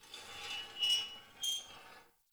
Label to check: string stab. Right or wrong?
Wrong